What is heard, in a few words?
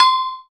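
PER PROCU, Procussion, percussion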